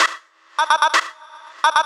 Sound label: House Loops, Vocal Loops House 128BPM